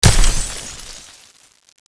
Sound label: iron door; doors